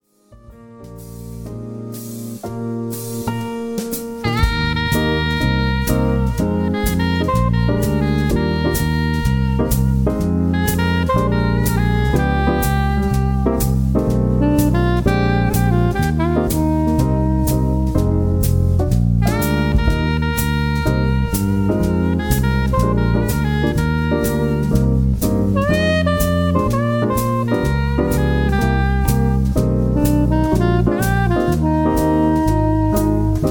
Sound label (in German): Sopran Saxophon